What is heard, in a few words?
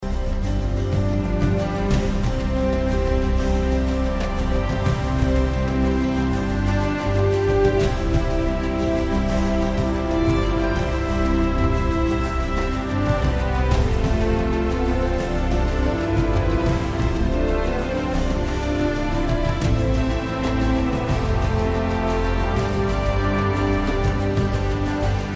Solo